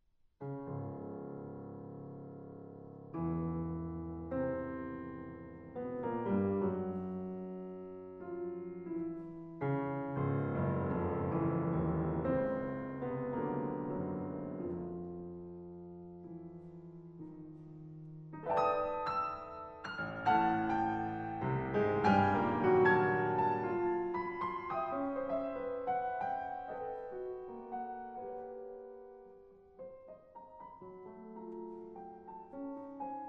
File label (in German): Klaviersonate Anfang